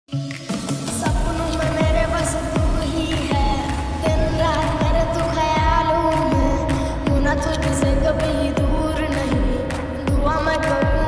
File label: love ringtone